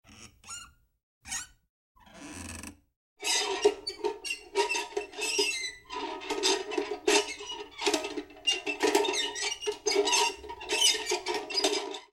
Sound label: metal, gnissel